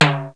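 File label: Roland 626 low timbale; Drums; Roland Tr-626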